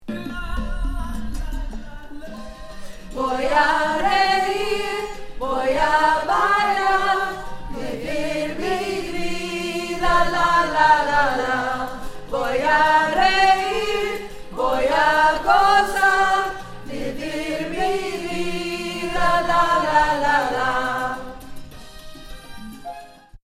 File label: unison choir